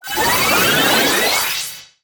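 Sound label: Summon Synchro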